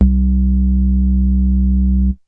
sh101 2, Basses

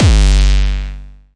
trap bass; instruments multisampled; 7rystan Trap Bass

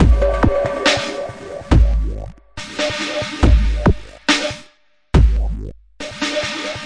070 dubstep breakstance wobble

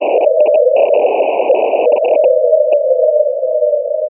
breaking up ufo static; Scars FNC SFX

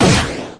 clicky things, Clicky Gadget Things; UNUSUAL KITS and FX

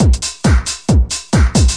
135 bpm hardbass house, drum loops and breaks

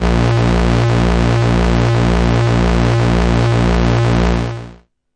destroy you bass A sharp 1

